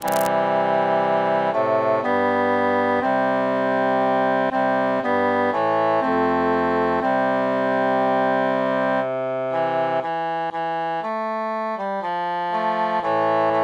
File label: cantus mute